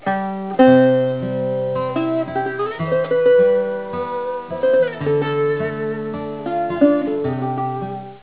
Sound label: guitar